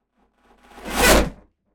Cinematic wood whoosh

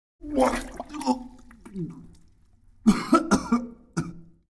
Man vomiting in toilet deep gaging

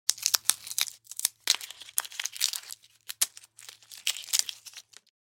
Hand peeling fruit; cooking

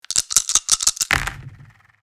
Board game map dice toss and roll; leisure

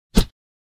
Fast whoosh metal crowbar

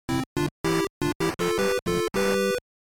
Retro 16 bit or 8 bit success melody win victory

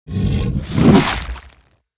Werewolf bite flesh attack

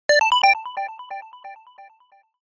Alert notification

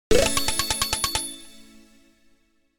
Casino slot machine small reward

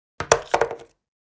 Wood hairbrush set down

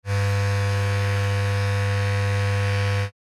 Electric hair trimmer buzzing short

